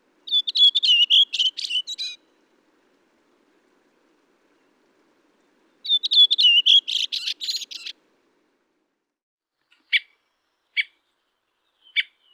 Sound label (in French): Grive mauvis; sonotheque ornithologie